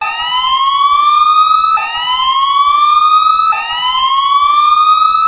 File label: emergency alarm tone; baresip